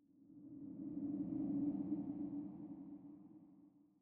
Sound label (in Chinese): 风